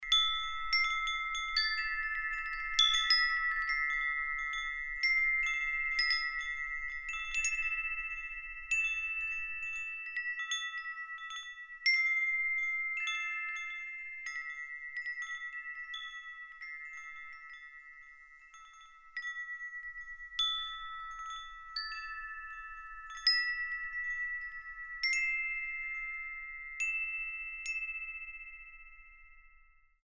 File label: windchime chrystal sound, crystal chime; windchimes